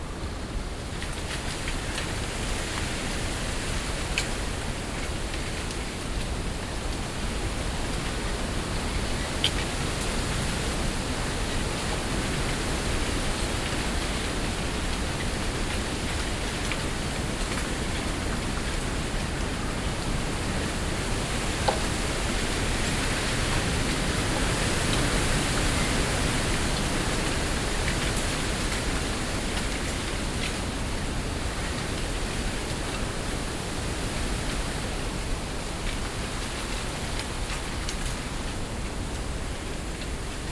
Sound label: Wind In The Bamboos